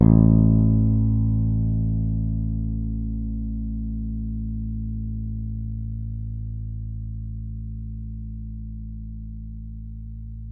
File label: finger, bass